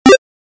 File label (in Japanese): 決定 レトロ; モノラル